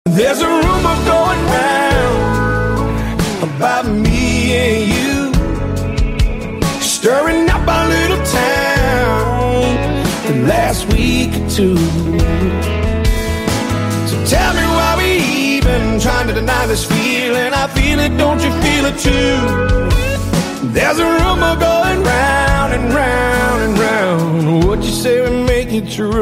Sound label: english song ringtone